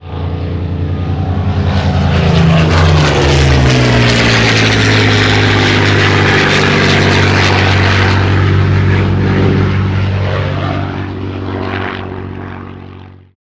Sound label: Aeroplane